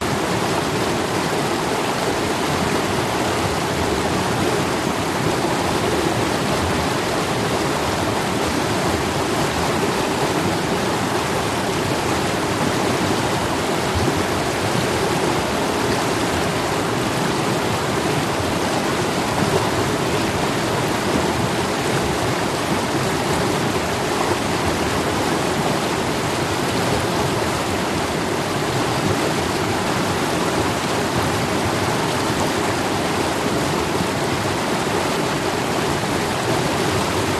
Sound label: River Rapids; soundbox library